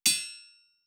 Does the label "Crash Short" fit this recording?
No